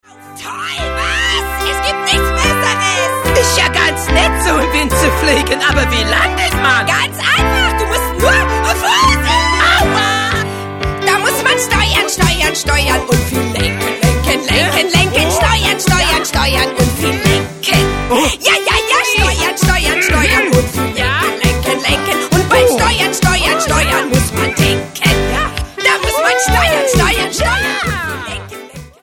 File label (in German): Lied